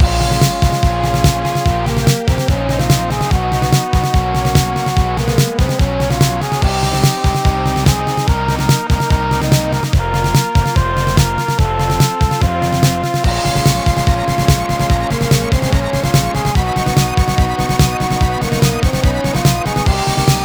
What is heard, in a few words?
Boss Loop; demons tier